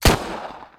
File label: Colt, handgun single shot